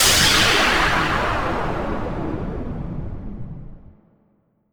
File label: space laser